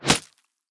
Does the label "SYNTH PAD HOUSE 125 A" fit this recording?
No